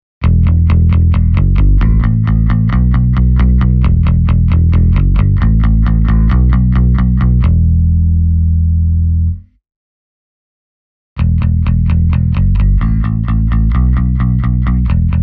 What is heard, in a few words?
plectrum